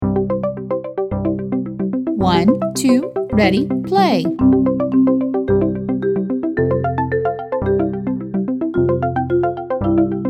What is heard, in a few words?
Twinkle Twinkle Little Star, ukulele; Rainbow Ukulele